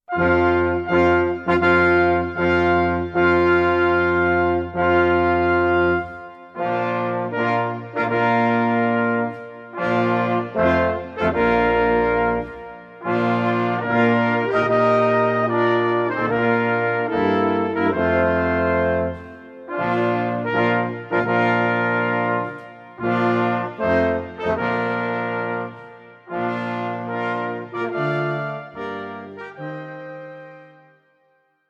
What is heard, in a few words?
Brass Quintet Bridal Chorus; Richard Wagner